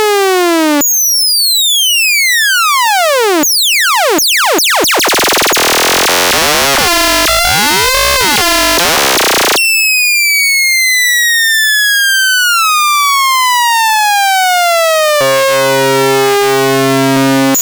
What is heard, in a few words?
hard sync saw mod